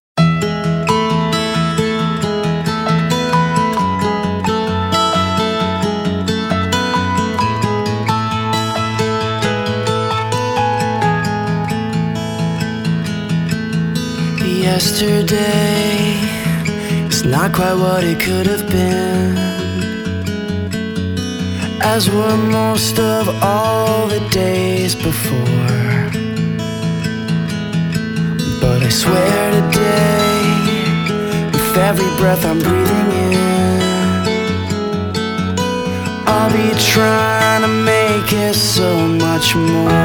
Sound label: Acoustic